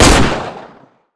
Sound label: revolver fire